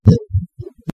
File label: pit drone idle; svencoop; legends